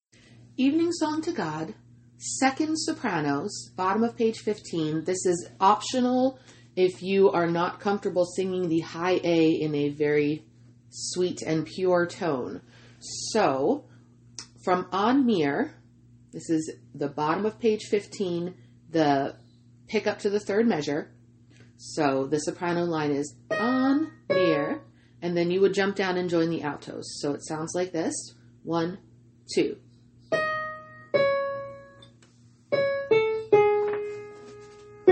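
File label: evening song soprano 2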